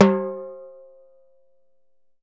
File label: TIMBALE, Salsa Kit; E-MU Producer Series Vol. 7 – Old World Instruments